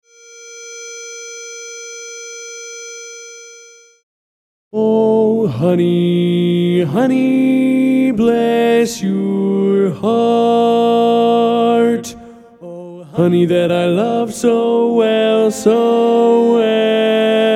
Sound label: Medley Baritone